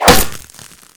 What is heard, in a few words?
arrow impact wood; weapons